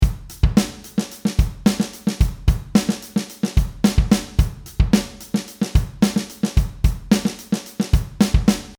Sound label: funk 110bpm